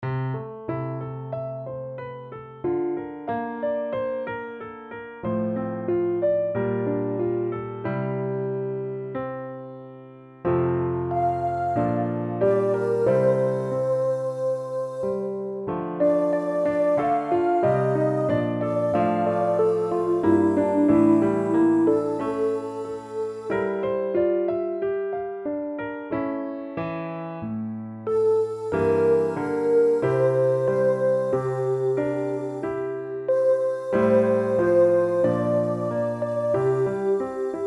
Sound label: Piano Study F HIGH